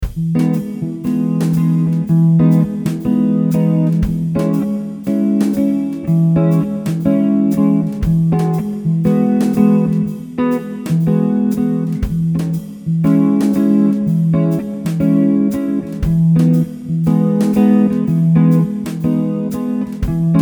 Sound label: E Major Chord Jazz